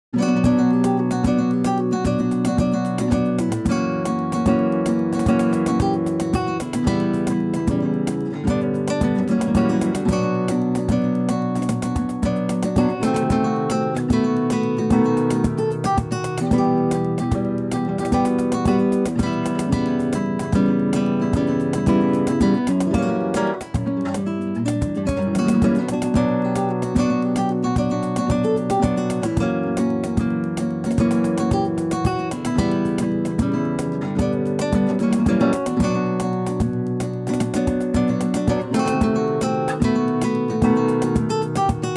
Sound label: Bulerias Con Cajon, classical nylon guitar; evolution honey nylon